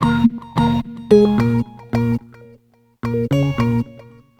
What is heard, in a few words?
Tones; Sonic Foundry; Sony Creative Software; Stylus Pressure Urban Grooves on Digital Wax; Nu Tang